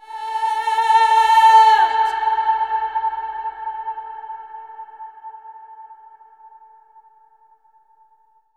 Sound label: Reverb Athmospheres, FX Vocals; Vengeance Samples